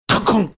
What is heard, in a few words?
making time clock punching in sound